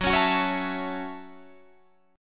F sharp Minor 7th F sharp, Guitar DADGAD

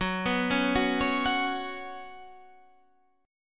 Gb Suspended 4th Gb, down, Guitar, Standard; arpeggiated; JGuitar

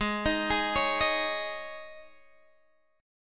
G sharp Suspended 4th Guitar Drop D chord, down

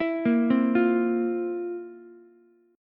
F Major 7th Suspended 4th F, Ukulele; FM7sus4 arpeggiated; JGuitar

